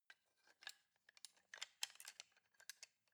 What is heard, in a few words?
knives wood handle